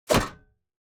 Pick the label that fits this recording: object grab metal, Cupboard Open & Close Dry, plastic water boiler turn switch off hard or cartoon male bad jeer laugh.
object grab metal